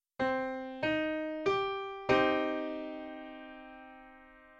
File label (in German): moll